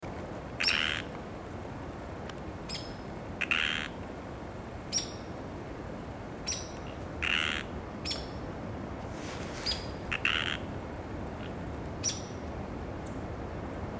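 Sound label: Pseudacris sierra Land Calls, frogs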